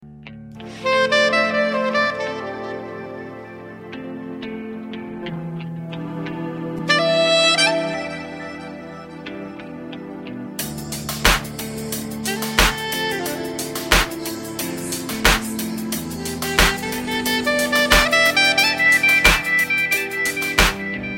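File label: Saxophone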